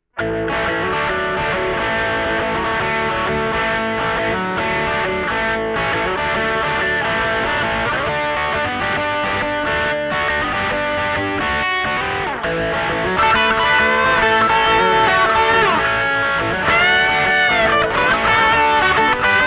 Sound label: rock